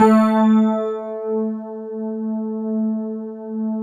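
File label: GLASS Rhodes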